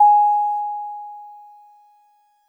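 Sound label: DreamLike DX100 G sharp 4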